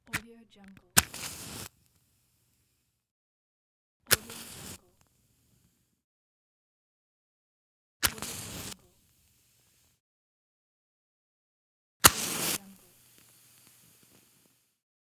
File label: matchbox sfx pack